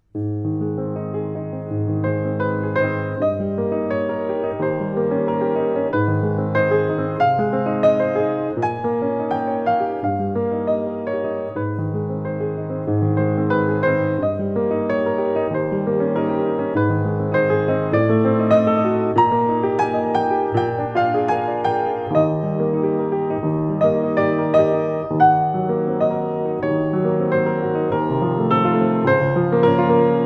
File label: Melodie in As Dur Allegro assai